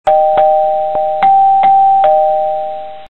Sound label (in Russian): zvonok melodichnyj, Звонок мелодичный